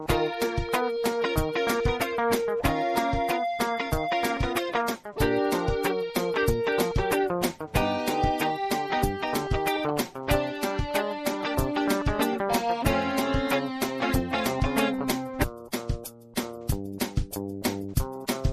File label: Funky Blues